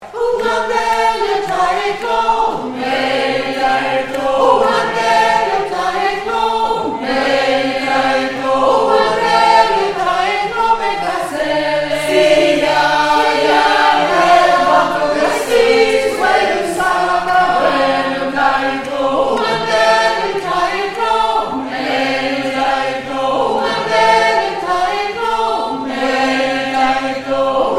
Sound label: Sop Alt Ten